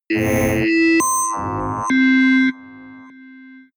synthesized bells medium; digital

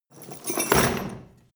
crash medium chain smash

